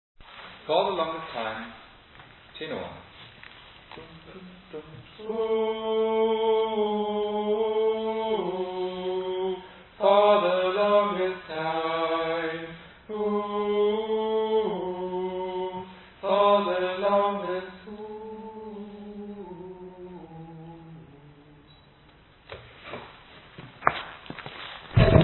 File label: Tenor